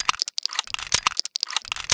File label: load revolver; gunslinger